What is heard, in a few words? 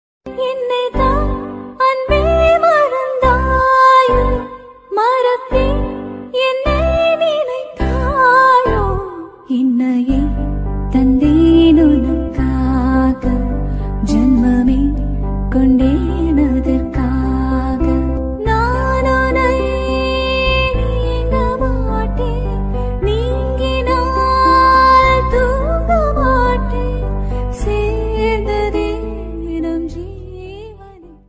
song, female version